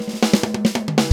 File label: Drum Fill